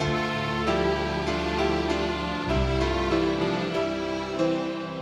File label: keys, Loops, Sad